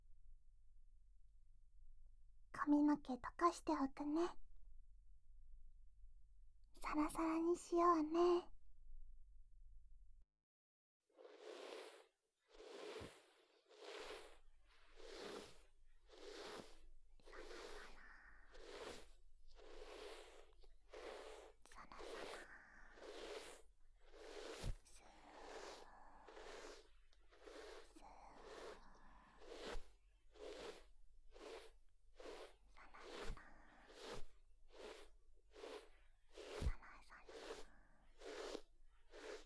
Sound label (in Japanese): ヘッドマッサージ＆ヘアブラシ